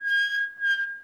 vehicle brake squeak loop; teardown; ps5 windows xbox series xs

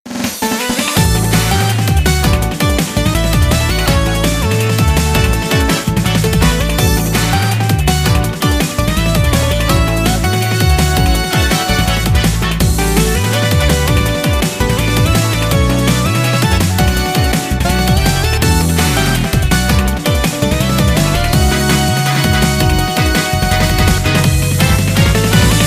Music Game General event

